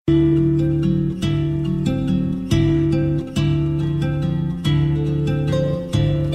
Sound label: Guitar Music